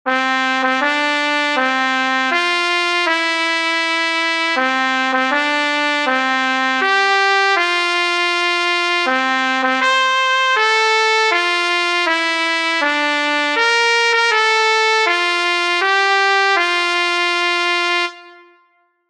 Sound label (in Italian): tromba; spartito per tromba in si bemolle